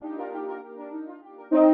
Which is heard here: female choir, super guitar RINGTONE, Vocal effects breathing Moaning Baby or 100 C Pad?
100 C Pad